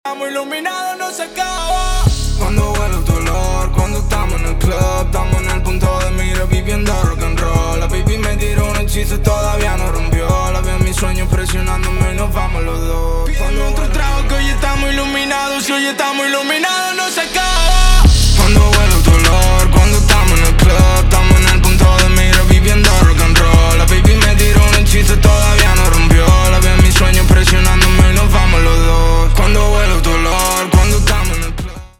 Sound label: ROCK STEM MASTERING